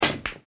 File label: pool ball hit